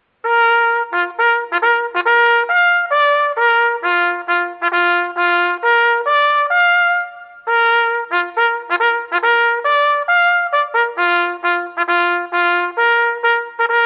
Bugle Call Tattoo

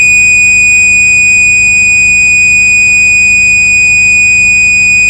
Intermittent

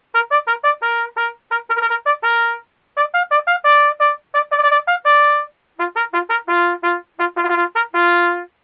Bugle Call Mess Call

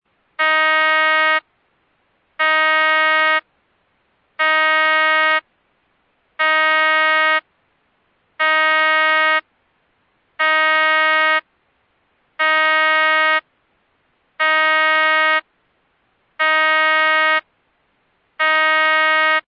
horn 1 sec pulses 10 times

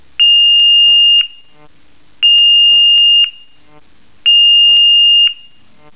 Intermittent 2850 Hz at 0.5 Hz (1s On-1s Off)

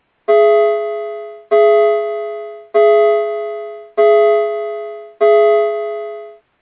Chime Single Strike Chime Musical Tone

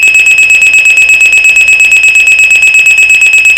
Simulated Bell 2600

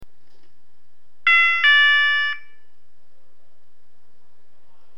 CHIME SINGLE 700-600HZ

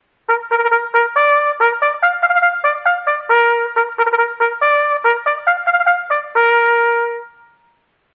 Bugle Call, Recall

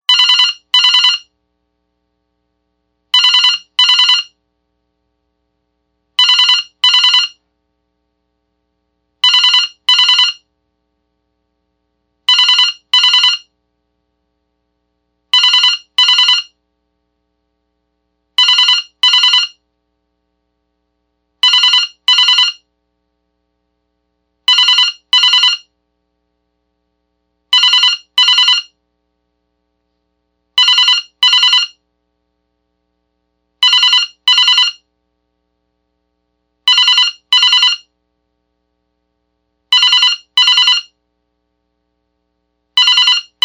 Warble (1000Hz & 1400Hz)

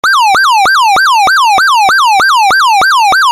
Siren Tone YELP

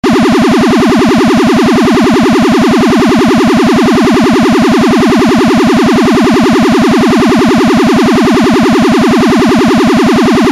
Siren Tone PRIORITY

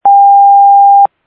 800Hz 1s on 1s off; PAGASYS

